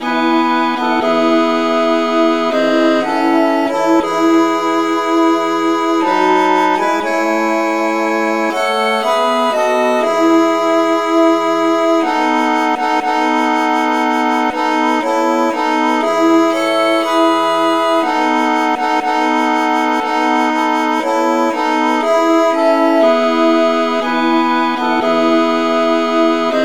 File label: traditional key A